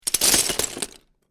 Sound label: Lego Several Pieces Drop On Floor; Miscellaneous Toy Sound Effects